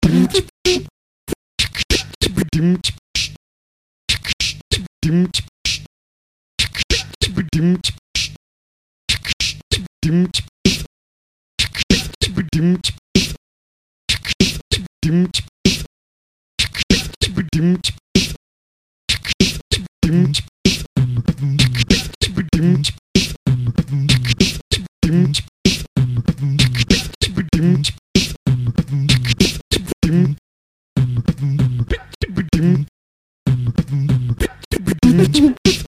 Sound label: beatbox